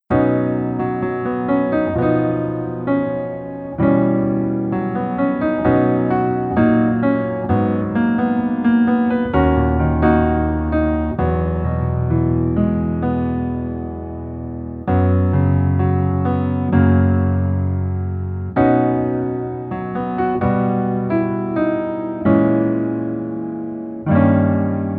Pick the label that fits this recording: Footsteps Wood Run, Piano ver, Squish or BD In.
Piano ver